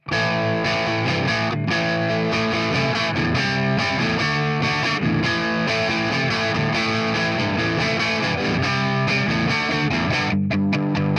jag ss crunch; fender classic player

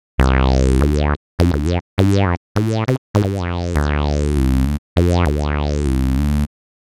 acid bass; fabfilter twin2

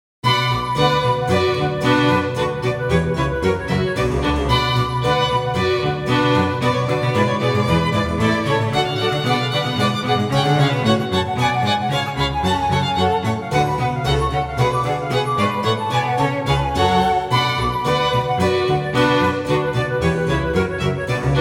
badinerie; J.S. Bach; Suite orchestrale #2; BVW 1067 en Si mineur; ik multimedia miroslav

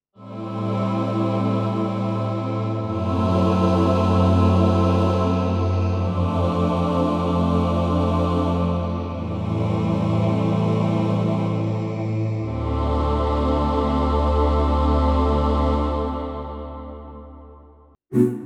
choir, yamaha tyros3

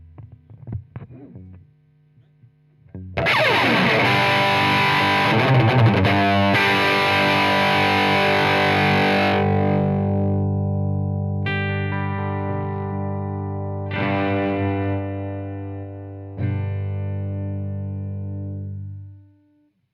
disto to clean; brunetti pirata141

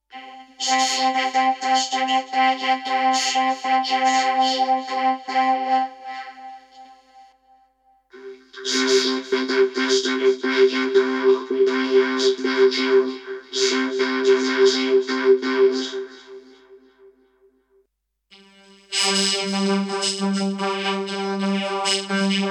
vocodizer; plugiator

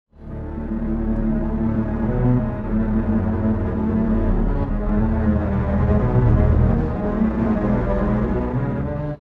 2, strings, pitchshift; usb uvi workstation

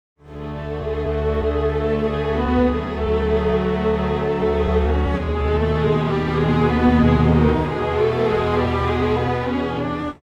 strings, timestretch

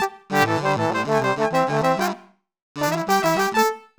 soul, brass; arturia

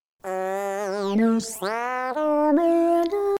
in a sentimental formants; celemony melodyne

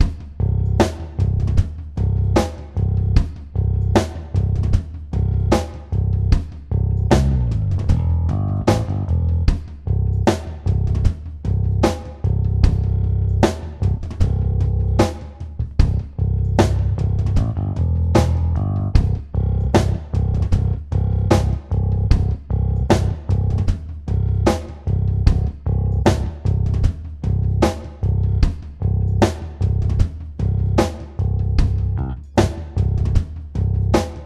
virtual bassist tribal rock; steinberg

